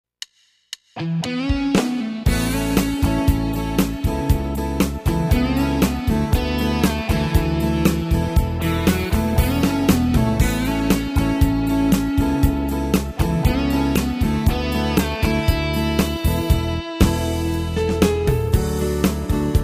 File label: New Country; Tyros Style Pro